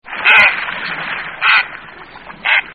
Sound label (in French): Heron Garde Boeuf; Ardeides; Le Héron gardebœuf; Lac de Puydarieux